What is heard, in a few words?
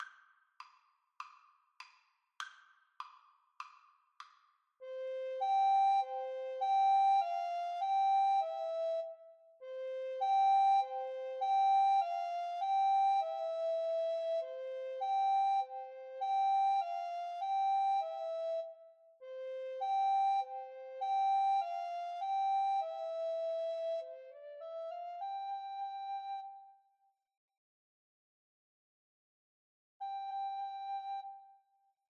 alouette Recorder duet